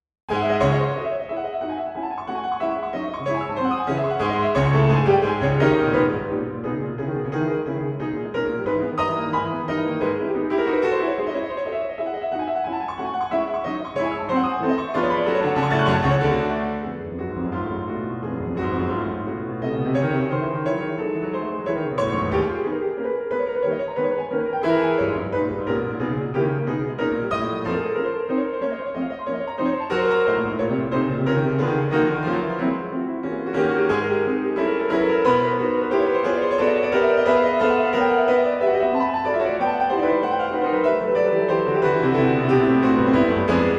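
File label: Chopin Etude Op10 No4, Pianoteq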